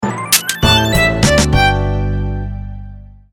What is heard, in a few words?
Power Off, System; Samsung; SCH-U490 Trance